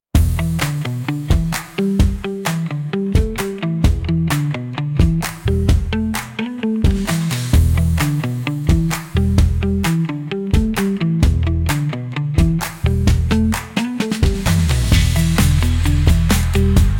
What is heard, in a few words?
Pop